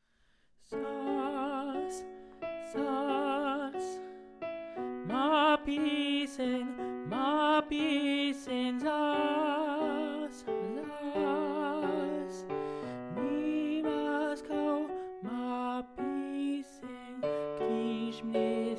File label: kdyz tenor